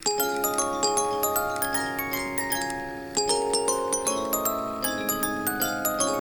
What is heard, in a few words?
Boxy Jingle